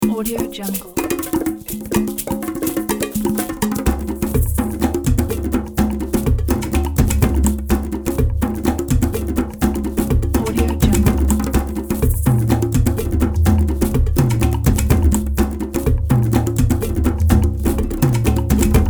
afro percussion

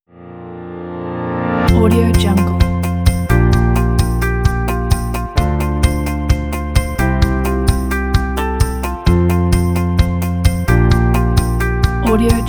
inspirational motivation

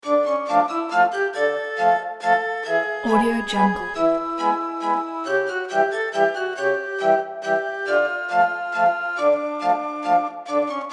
spooky fairground

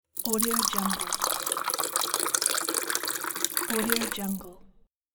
water filled cup

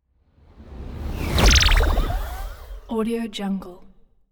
magic warp personal jump wobble

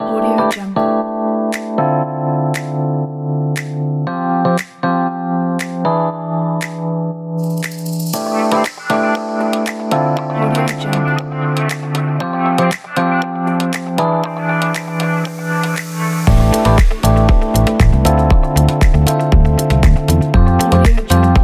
upbeat fashion luxury

